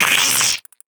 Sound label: Insect Creature Attack, Monster Roars; Phase 4 Libraries